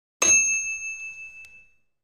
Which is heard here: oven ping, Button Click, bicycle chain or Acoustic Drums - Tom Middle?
oven ping